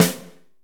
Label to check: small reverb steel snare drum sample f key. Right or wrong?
Right